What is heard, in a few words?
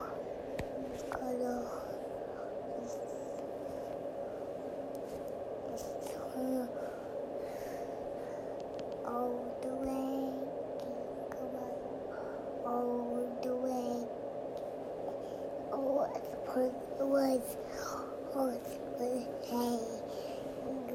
singing jingle bells while going to sleep